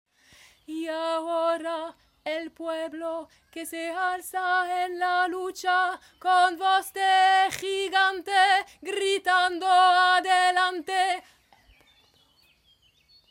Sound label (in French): refrain voix basse